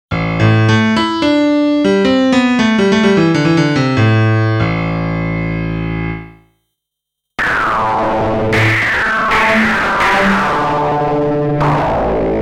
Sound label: yamaha ex5r sounds fdsp tornado piano raw and tornado